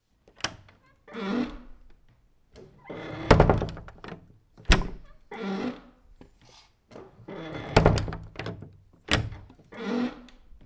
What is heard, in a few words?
door sounds